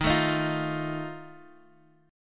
D sharp Suspended 2nd Flat 5th D sharp, Guitar Half Step Down